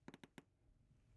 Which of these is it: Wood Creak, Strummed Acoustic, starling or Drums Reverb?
Wood Creak